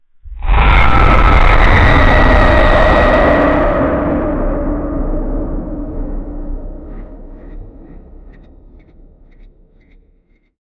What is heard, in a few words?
a terrifying roar of a, creatures